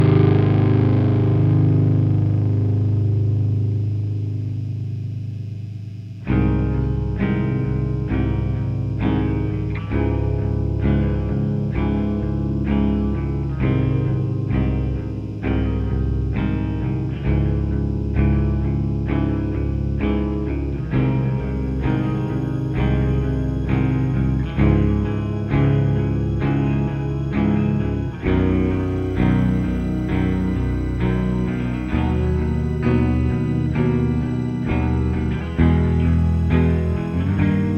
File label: instrumental